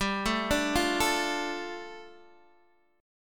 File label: G 7th Suspended 2nd, Guitar DADGAD